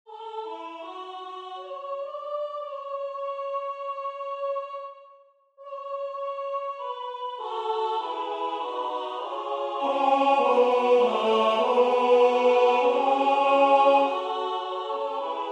basse; Ave Maria; Victoria

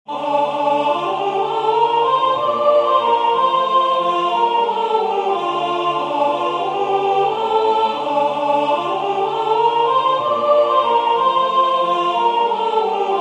soprane; Benis le Seigneur o mon ame

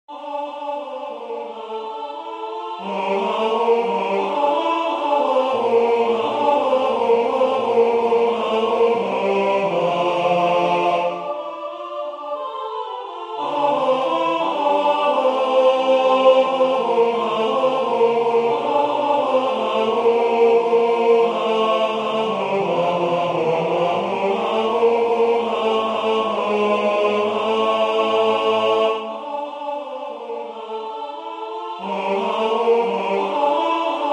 O Dieu de clémence tenor

